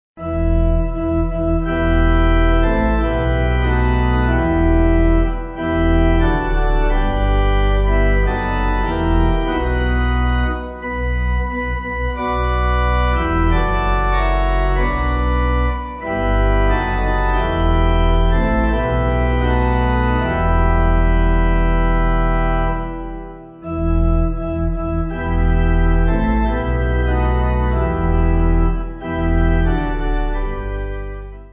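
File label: Pipe, hymn backing; Lord Speak To Me; Llef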